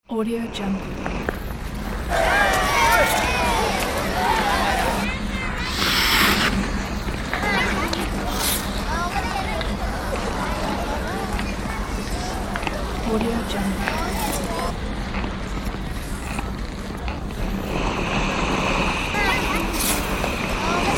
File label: city ice rink